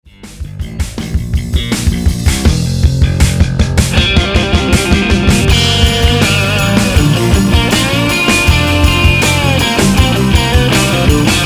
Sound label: solo